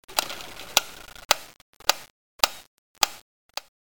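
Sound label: metronome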